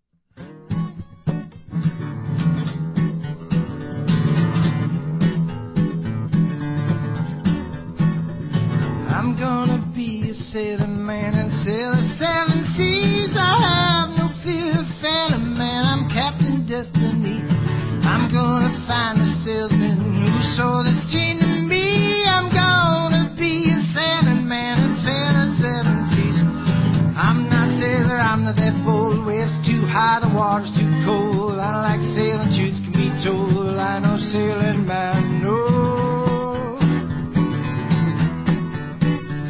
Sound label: Acoustic